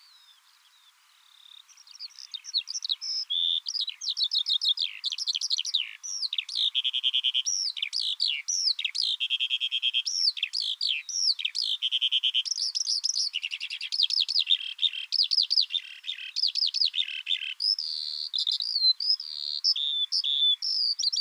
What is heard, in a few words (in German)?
Feldlerche Alauda arvensis, vogelstimmen